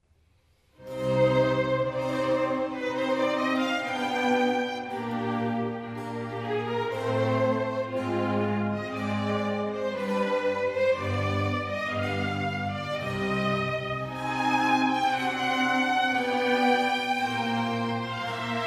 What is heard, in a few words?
Sinfonia for Strings in C major; RV112; Antonio Vivaldi